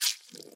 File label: blood squish